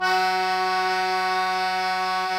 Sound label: KEY, Cajun Acord; Club-50 - Foundations Roland; Accordians